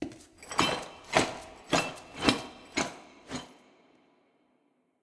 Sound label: dance